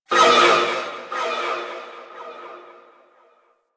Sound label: Vocal Wailing Song Bursts, Enemies; Warframe; Teralyst; DE